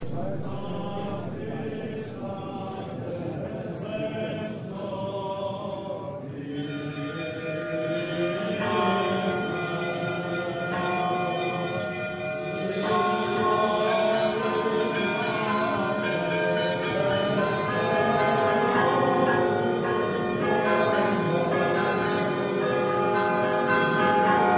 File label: bells, greece